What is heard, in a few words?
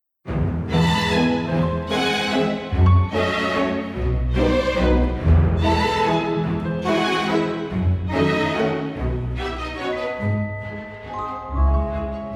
Waltz